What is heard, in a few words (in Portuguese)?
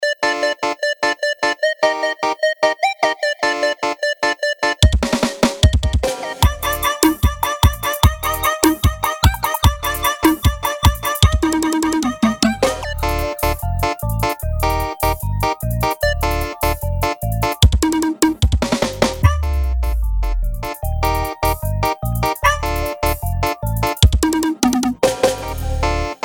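bregadeira gamer instrumental beat type beat; base de uso livre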